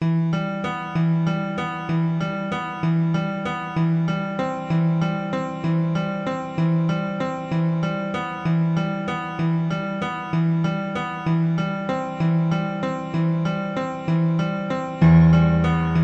Piano

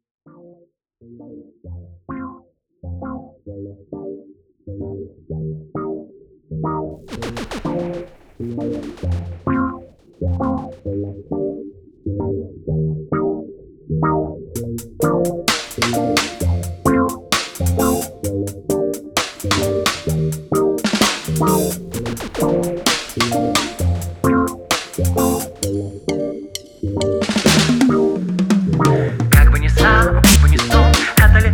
Dubstep Version

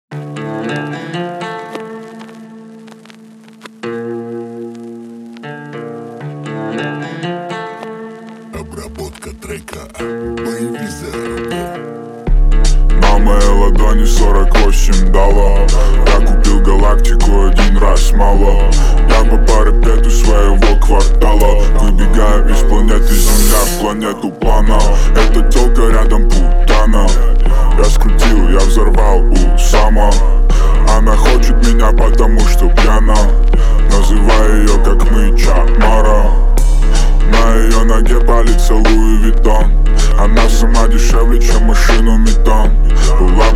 33.35.37hz Low Bass

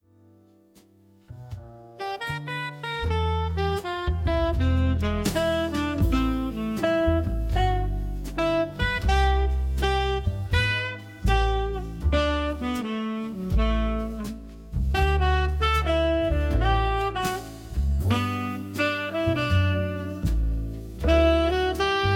Jazz

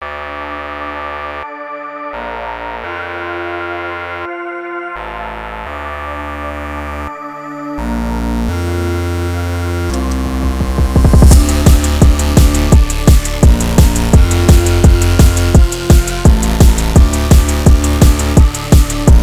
dnb

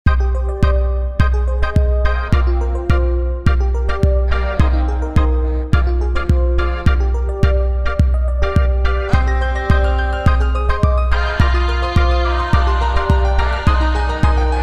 nightcore reverb

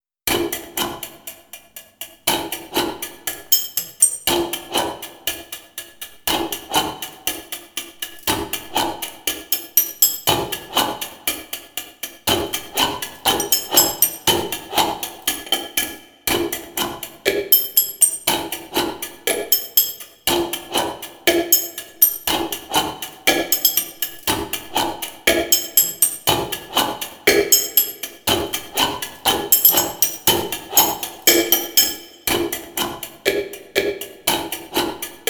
percussions

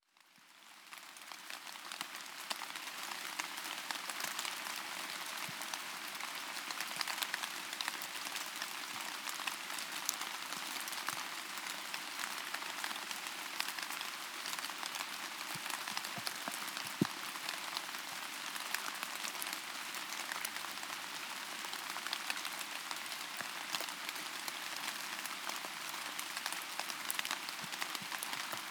Rain Sounds Rain for Deep Sleep

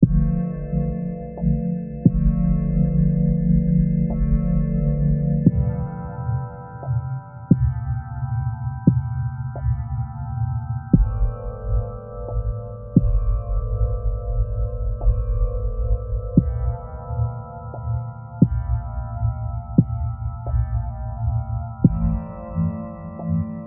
Binaural hertz

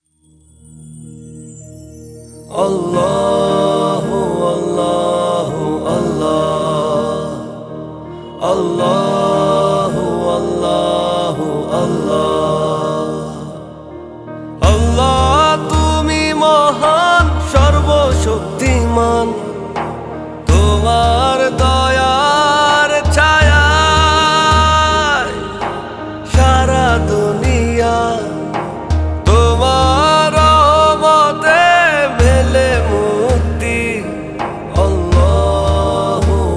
Flute, La Ilaha Illallah Muhammadur Rasulullah

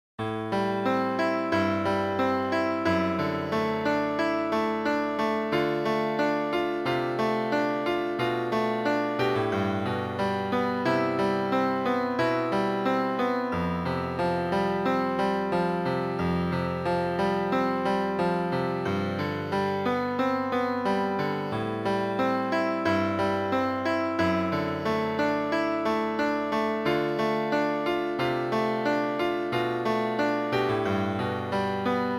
Piano Ballad

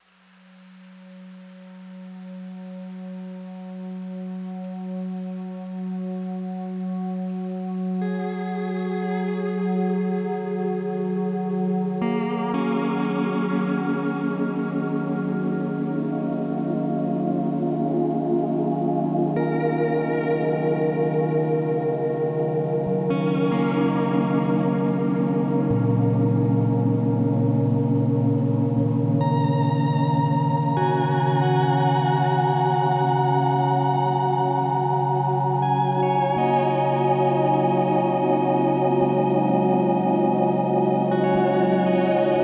White Noise Tone of Silence

